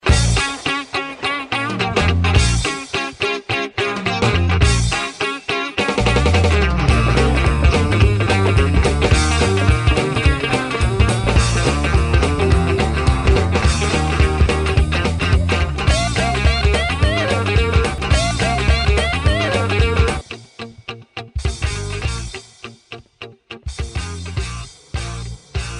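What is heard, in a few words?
Varanam Aayiram Background music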